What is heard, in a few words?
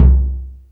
TIMPANI